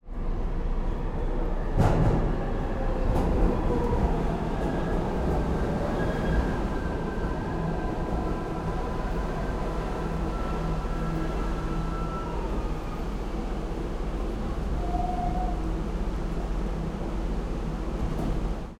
Subway, New York, 6 Line, Up, Stop, Chime, Door Open